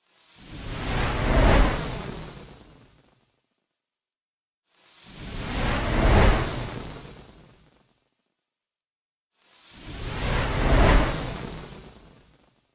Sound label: Fire Flare Whoosh Slow